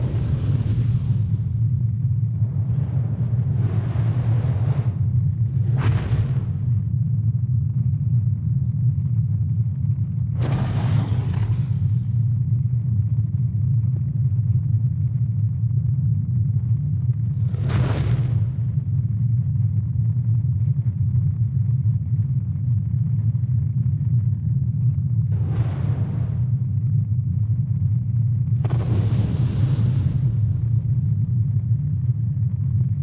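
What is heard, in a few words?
ambient solar storm; Stellaris; cosmic storms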